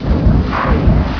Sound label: drill; acdemo; AC Demo 3-14-98 RipCord